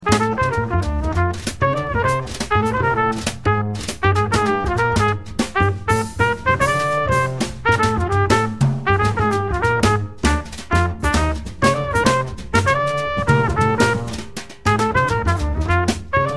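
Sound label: quartet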